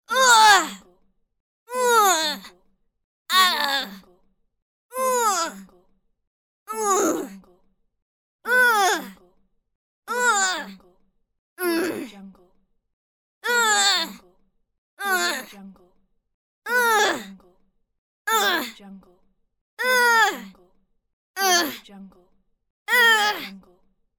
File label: girl yell